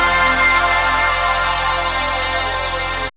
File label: SYN TV, dance synth; Sound & Vision - Gigapack I CD 2 (Roland)